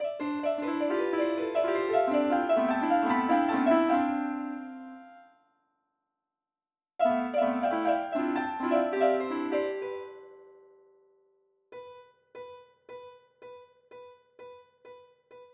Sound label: piano